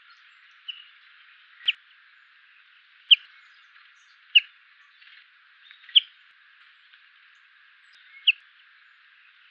call, chaffinch, flight call. - SEX/AGE: unknown - COMMENT: This is the typical flight call; Fringilla coelebs; ALTITUDE: +130 m.